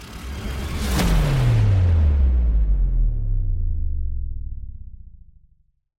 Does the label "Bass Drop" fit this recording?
Yes